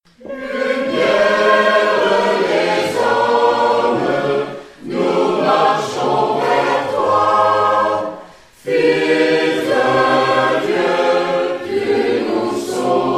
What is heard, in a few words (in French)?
Tutti; Lumiere des hommes